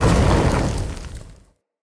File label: Fire Pillar Ignite